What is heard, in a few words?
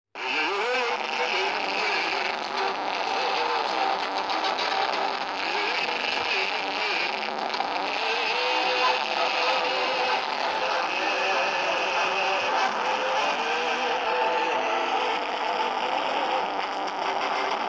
song earrape